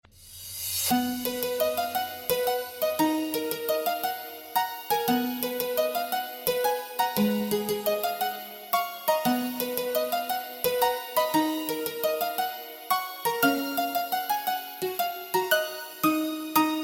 new nokia 8 ring ringtone

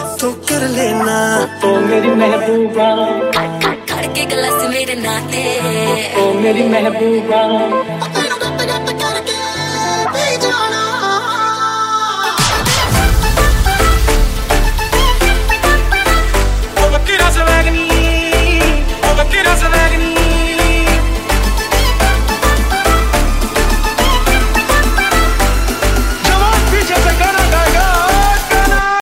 bollywood ringtones

